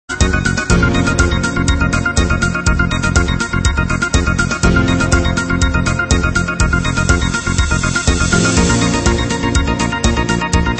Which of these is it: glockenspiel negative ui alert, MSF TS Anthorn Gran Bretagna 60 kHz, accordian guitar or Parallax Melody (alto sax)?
Parallax Melody (alto sax)